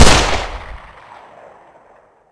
webley shoot; svencoop; ww2projekt

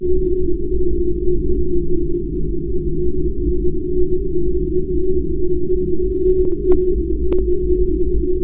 ambient gate

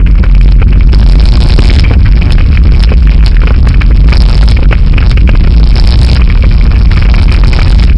boiler angry; undervault

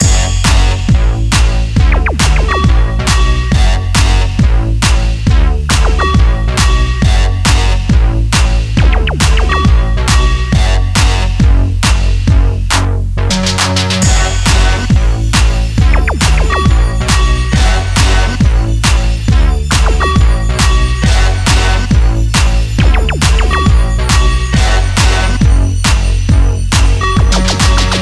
instrumental

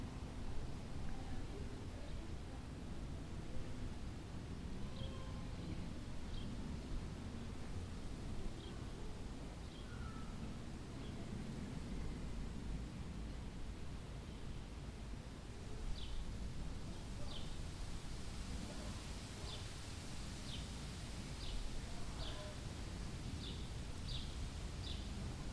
courtyard

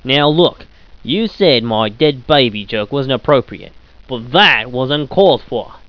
ahh, scientist

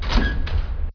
door open

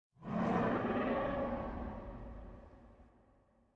Dino Distant; docks

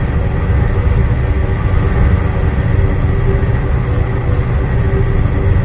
machine room; secret garden

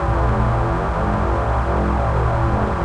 scream machine

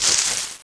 bush; de scheiterhaufen2

